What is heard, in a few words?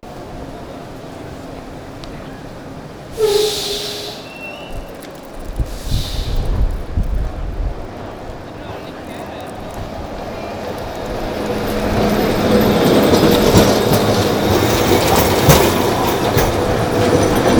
traffic